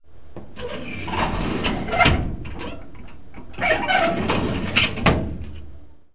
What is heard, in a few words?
cabinet